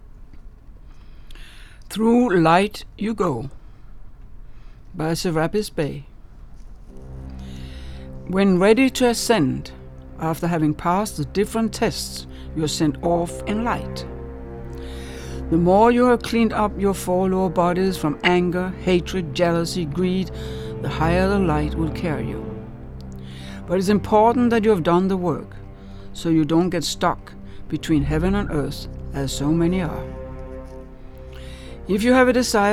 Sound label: Music; Serapis Bey; When ready to Ascend after having past the different tests you are sent off in light.